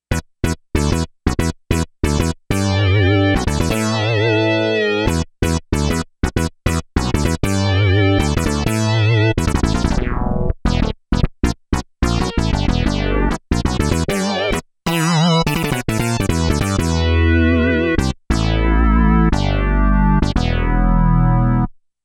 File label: Tension